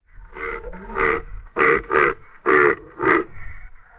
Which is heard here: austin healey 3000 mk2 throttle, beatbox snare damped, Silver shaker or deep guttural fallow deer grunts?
deep guttural fallow deer grunts